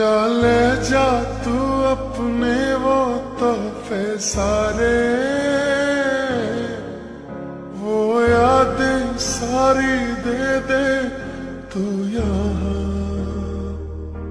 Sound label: Ringtone Slowed Reverb